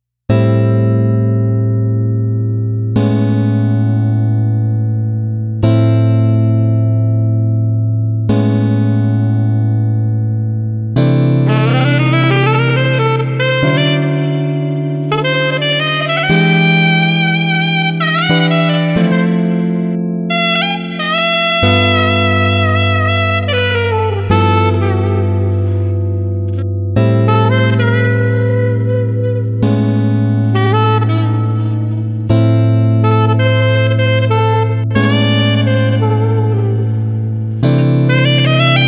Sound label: Guitar Sax Jazz 90